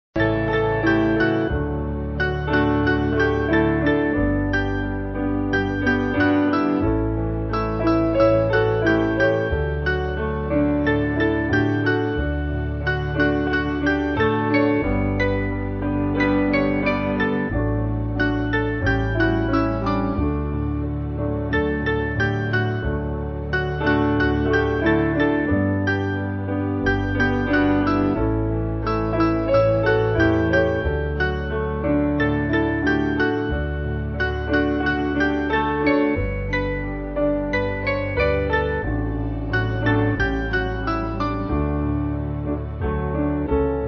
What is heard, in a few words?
Piano